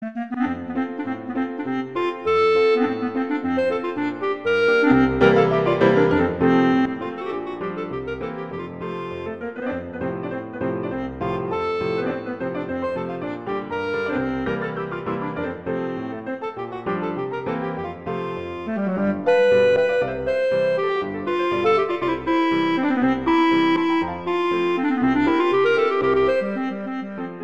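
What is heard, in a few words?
Allegretto, violin; Brahms; Hungarian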